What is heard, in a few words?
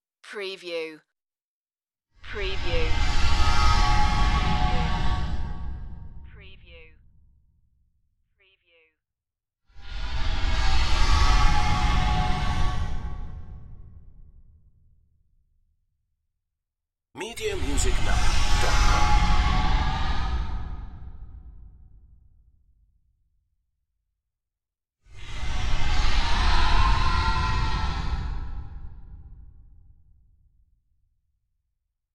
eerie swooshes